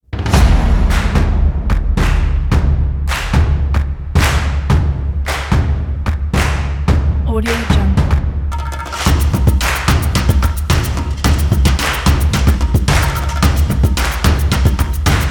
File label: percussive; eco music